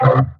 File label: Growl; osu